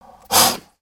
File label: Pet Croc Alert; creatures pets; Lego